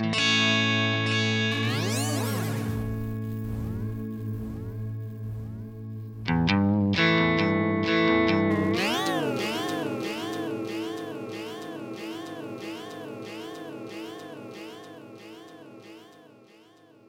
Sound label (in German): Silos Delay Digital und Analog Tweaking; EQD